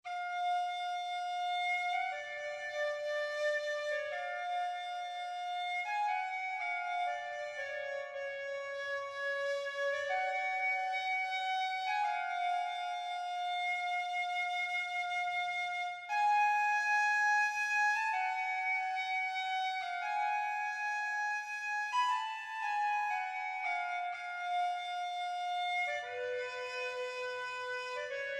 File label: calliope flute; God of War Chains of Olympus; psp